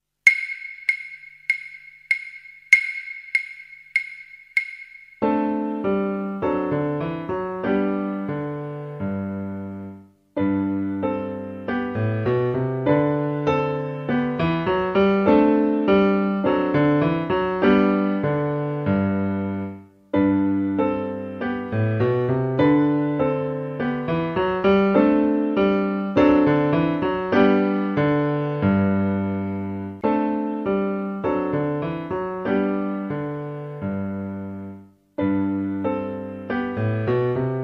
rustig liedje

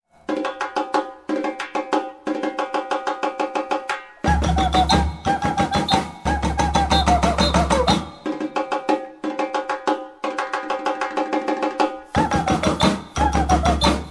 vraag en antwoord samba

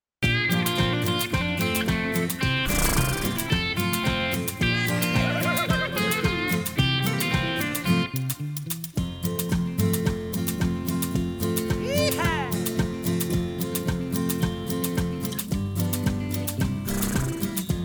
instrumentaal

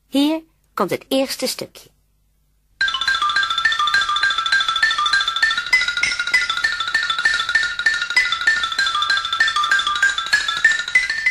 Xylofoon of vibrafoon

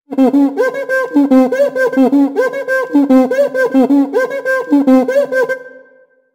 voorbeeld cuica

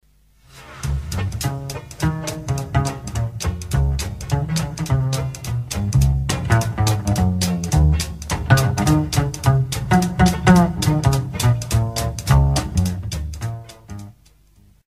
Pizzicato strijken

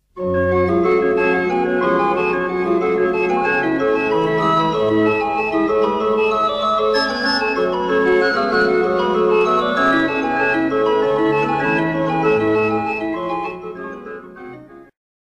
Orgel

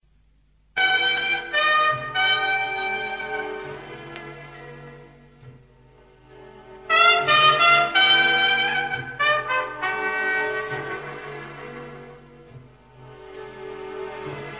Trompet in jazz

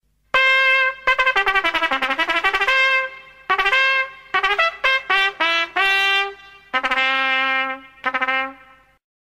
De trompet met of zonder demper zonder

